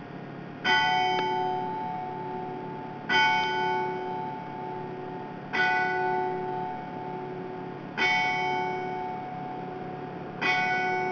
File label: bell, clocks